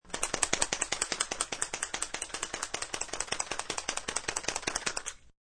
Flashlight, Self powered flashlight shaken for power; Sound Effects:Electronics